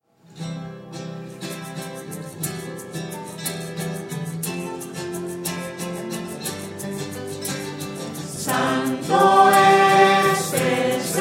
santo shuwa voces

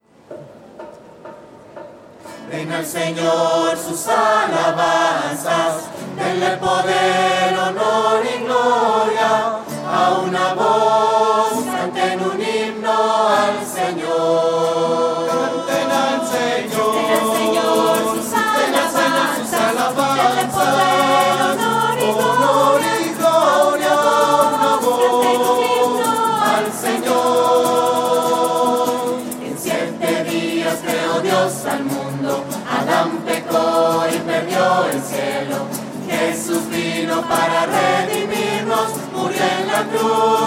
voces; misas 2012

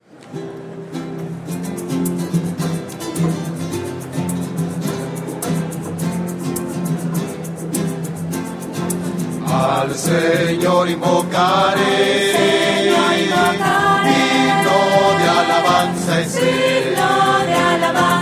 voces; al sennor invocar; misas 2012

